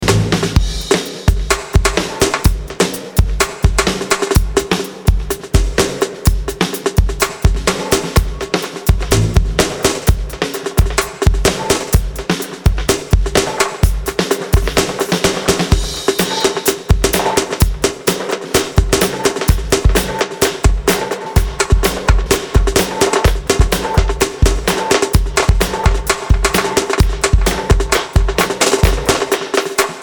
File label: Drum Dub